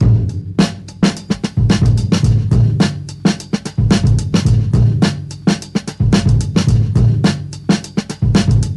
109 bpm drum groove A key, kick tuned to the A note; drum loop